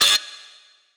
vox

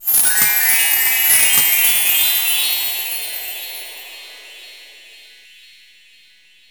MAP COUNT RANK; maimai

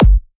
Dr Kick

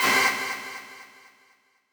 stab

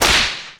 Snare; 辉夜大小姐

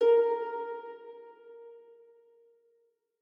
harp

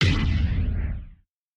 breakbeat

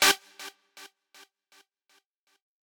lead

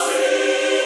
choir

